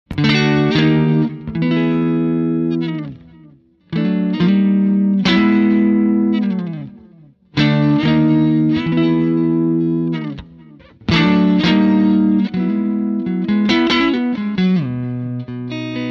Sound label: wind